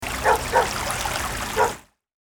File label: dog lake